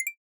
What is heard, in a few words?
Confirm; Syrup 2 Candy Alchemy RPG